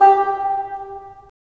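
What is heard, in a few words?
wind bassoon; LA Composer vol. 3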